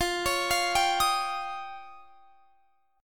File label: F 7th Suspended 2nd Sharp 5th F; F7sus2#5; x,20,23,22,20,23; arpeggiated